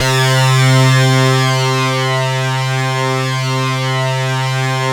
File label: MEMORYMOOG BASS C3; Zero-G Ambient 1